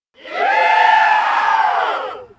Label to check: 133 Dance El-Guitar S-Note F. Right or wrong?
Wrong